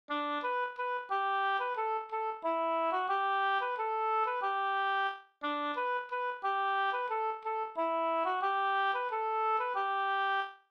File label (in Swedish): vismelodi